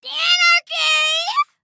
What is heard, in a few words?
Yell